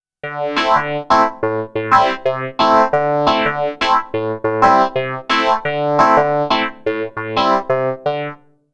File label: FM Synth; Sonicware ELZ 1